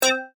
Multimedia Beep, Technology, Multimedia, Beep; BlastWave

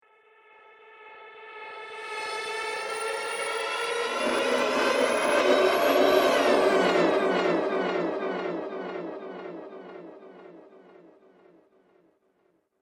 Whoosh Future Failure; sound design; Whoosh Sound Effects

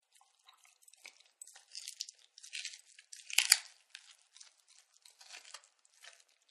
Bone Crunch, Dogs; Animals; Partners In Rhyme

